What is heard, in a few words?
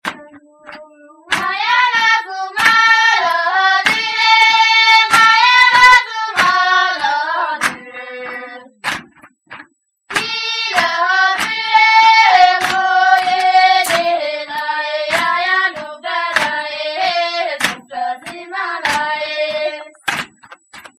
Roof Workers; Drepung